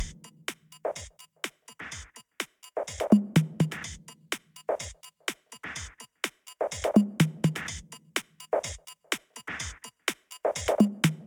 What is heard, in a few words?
Interactive Music Beat; Interactive Music - The Pod(Karting) - Beat